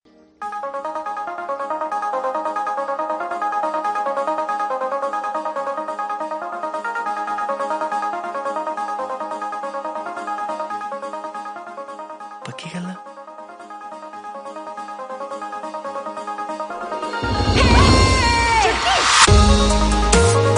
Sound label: Awesome Instrumental